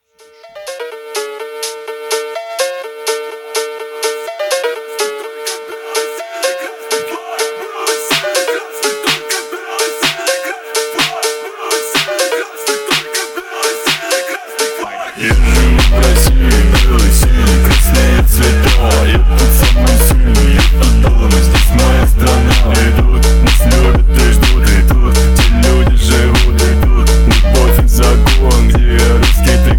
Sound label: Fonk